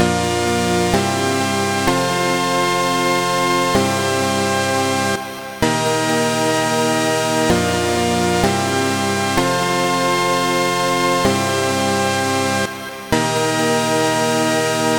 melody chord; EDM; Ableton Live MIDI effects

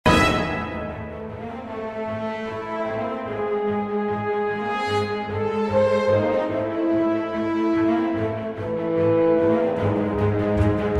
mix reverb, epic orchestral; epic orchestral track

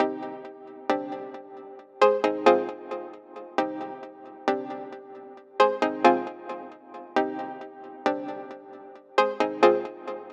chord; Ableton's arpeggiator; progressive trance lead sequence

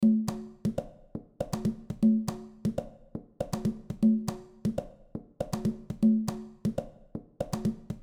basic Perc; Ableton Live; percussion

